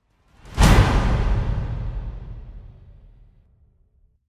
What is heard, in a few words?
Whoosh; Gothic Storm Music